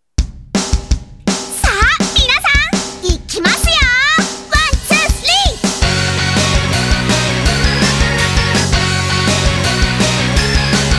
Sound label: powerful beat; tamagotchi seishun no dream school 3ds